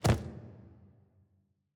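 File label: ambience room polygon punchbag training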